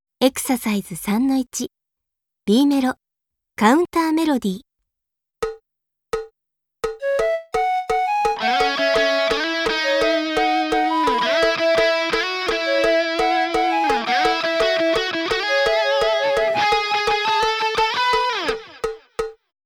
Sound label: Mellow Counter Melody; K-ON